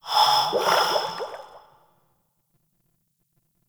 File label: Grineer Frogman Breathe; Warframe; Breathing